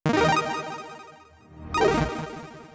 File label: Jingle Start; pang adventures